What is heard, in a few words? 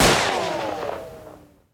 gambling shoot window; Wild Guns